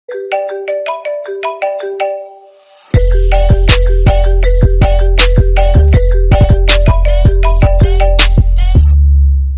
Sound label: Apple iPhone 7 Mix; toque de celular